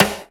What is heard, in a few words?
Perc Hit Hard; Drums; Misc Percussion